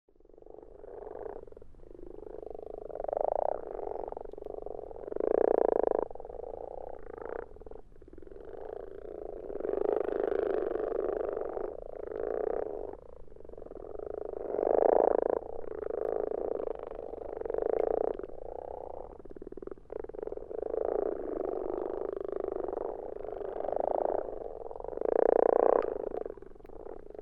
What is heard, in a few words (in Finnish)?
sammakko, ruskosammakko